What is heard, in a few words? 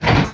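menu lever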